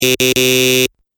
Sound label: Gameshow Interrupt Buzzer Sound; Miscellaneous Electronic Sound Effects